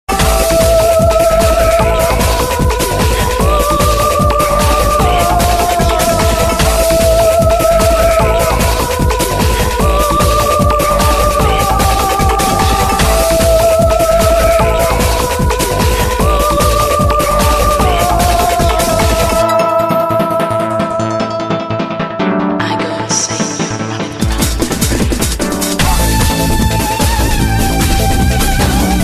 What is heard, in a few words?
New Age